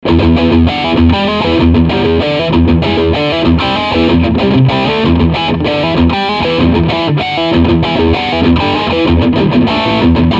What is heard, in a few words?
Overdrive Riff